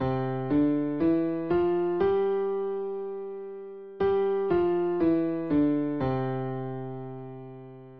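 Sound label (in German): beide Hände, klavier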